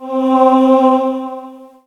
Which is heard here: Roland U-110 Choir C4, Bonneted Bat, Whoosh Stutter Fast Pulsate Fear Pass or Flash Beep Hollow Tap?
Roland U-110 Choir C4